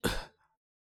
Voc Male Land

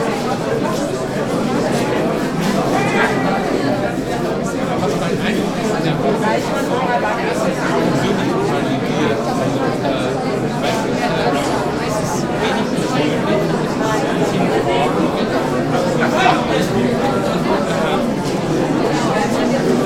Crowd Talk Loop

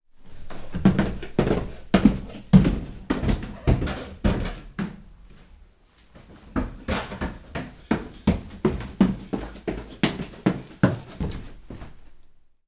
Stairs Jumping

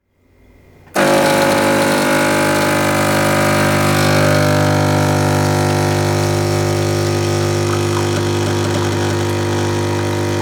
Espresso

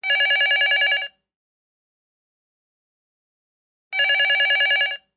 Digital Land Line Phone Rings Once Loopable

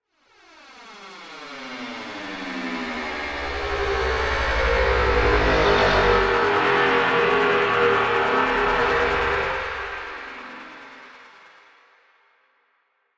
Rise Fall Impulse

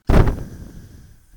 Puff Of Smoke

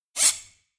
Swipe

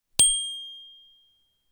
Chimes

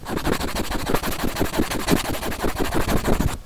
Scribble

